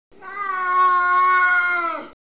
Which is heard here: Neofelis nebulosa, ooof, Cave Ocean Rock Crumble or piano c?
Neofelis nebulosa